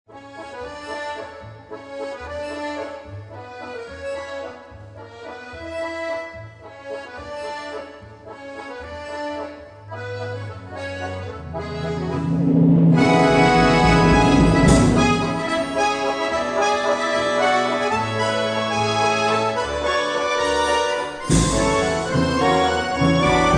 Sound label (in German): Schwanensee Walzer